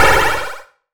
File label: Retro Magic